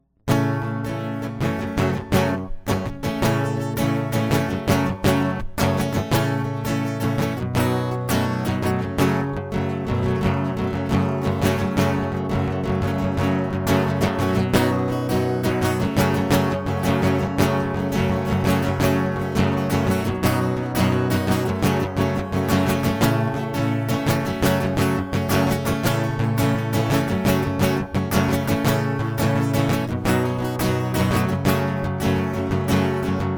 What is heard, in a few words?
Acoustic; MegaEpix